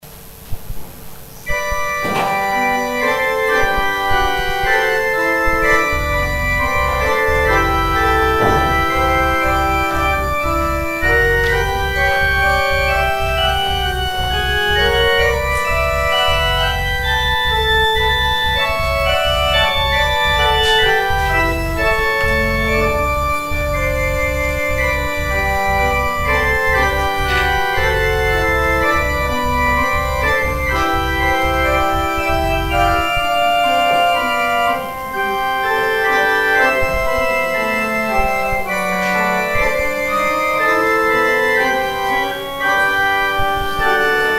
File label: organ interlude; played during the offering